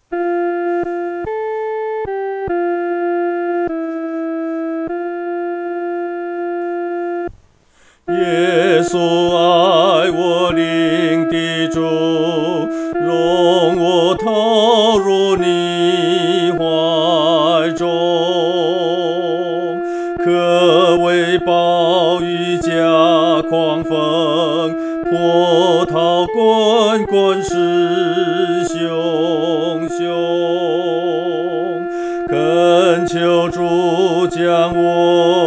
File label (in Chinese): 独唱 第二声